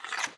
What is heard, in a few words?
game slide frame; theodyssey